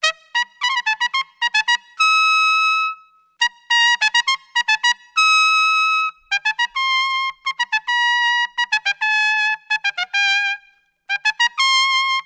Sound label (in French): Trompette piccolo